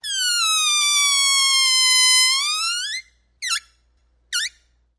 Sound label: Squeaker